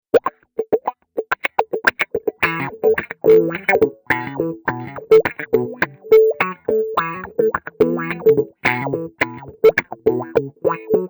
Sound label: Cry Baby